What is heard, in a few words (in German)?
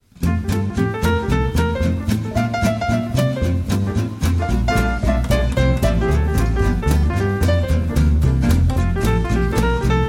quintett live